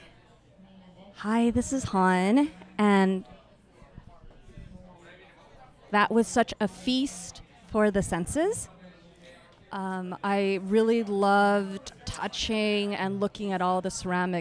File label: Audience Recording; a-meal-premiere